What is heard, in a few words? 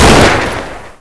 ruger 77 fire